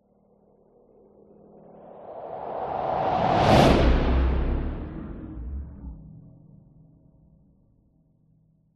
sound design whoosh horror slow heavy whoosh into long lightly droning tail; zapsplat